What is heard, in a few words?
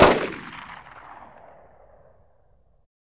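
9mm exterior shot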